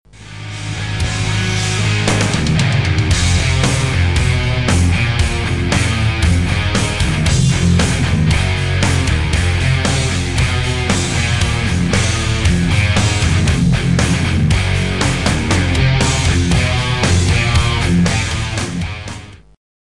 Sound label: metal